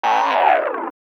Mutalist Speaker End Scream; Warframe; Proto Infested; Abilities

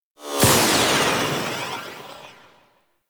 Hand Rocket Launcher Tail; Warframe; Corpus

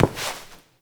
Step; Warframe; Koumei

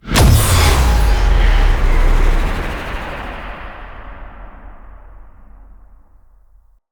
Canon Fire; Cinematics; Rail Jack; Rail Jack Archwing Canon

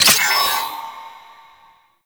Gas City Lightning Rod Charge; Warframe; Corpus Gas City; Gameplay Remaster; Ambience

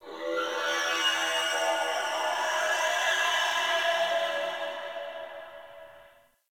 Portal Cry One Off; Warframe; Chimera

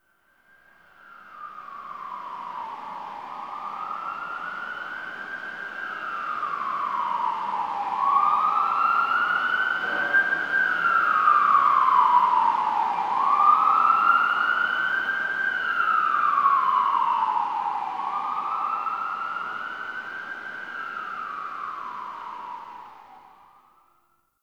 Exterior Distant Ambient Siren; Ambience; Warframe; Nineteen Ninety Nine

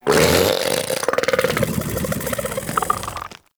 Bug Vocal Tranquillized; Characters; Warframe; Hunting Critters; InfKDriveBug

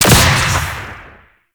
Choir Erupt Proj Explosion

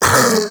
Infested Splitter Split Vocal Pain; Warframe; Grey Strain

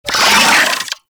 Wall Climb Movement Vocal; Warframe; Oraxia

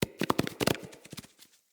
Barrel Apple Spill

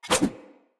Large Dagger Whoosh; Weapons; Enemy; Daggers; Snc Large Dagger

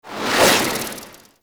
Grenade Throw Whoosh; Warframe; Zealoid Prelate

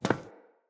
Friendly NPC Step Default; Characters; Footsteps

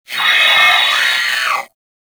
Sentient Brachiolyst Death; Warframe

